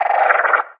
advisor screen; episodic; Source engine

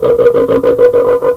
hunter laugh; npc; source engine; ep2; ministrider

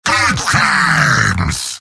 heavy positive vocalization; mvm

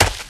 grass, footsteps run; source engine; left 4 dead 2; infected

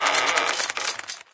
bridge rope squeak

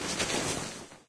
foot slide, zombie; Source Engine; video game; npc

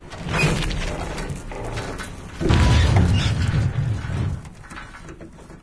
stalker shakes; source engine; episodic

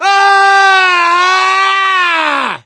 death scream, gambler, survivor voice; source engine; left 4 dead 2

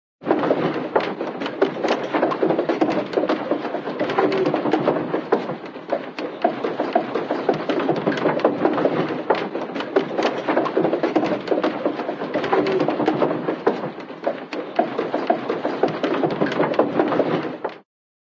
windmill old wooden windmill turning; cartoon

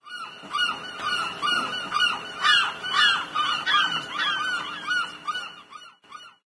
seagulls ambient, coast; source engine; hl2; video games

